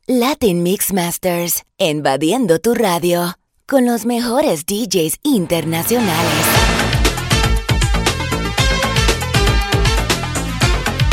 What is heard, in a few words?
Spanish Freestyle